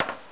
drop

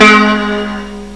plucked, string instruments; Sound Effects for Multimedia - Volume 1

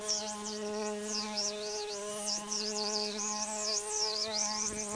fly sound

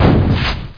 exp fire, weapons; Quake; PainKeep; PC Gamer 46

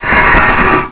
bend

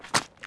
land mud, ambient; Shadow Man

